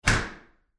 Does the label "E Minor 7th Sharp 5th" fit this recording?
No